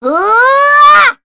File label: Vox Casting; Sonia; kings raid; en